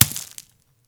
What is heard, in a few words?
lrg rock land gravel; 3 Leaf Productions - Audio Pack; rock-wood sfx(mini pack)